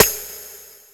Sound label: rimshot, Ragga & Dub Reggae